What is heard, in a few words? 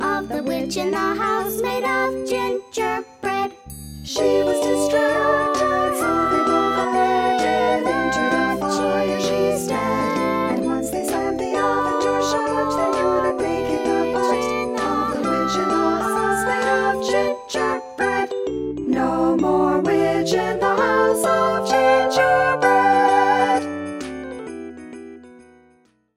All Voices; Hear the passage with all voice parts sung